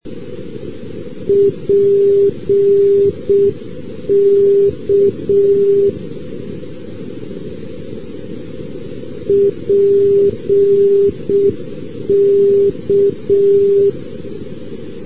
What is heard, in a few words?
ndb st. petersburg-pulkovo